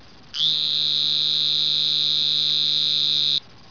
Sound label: green toad call; texas nature trackers; amphibian watch